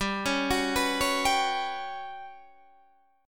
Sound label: G Major 7th Flat 5th, Guitar, DADGAD